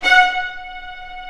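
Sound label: Vio spiccato F#5; Digital Sound Factory Virtuoso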